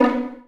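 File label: Vio pizzicato B2; Digital Sound Factory Virtuoso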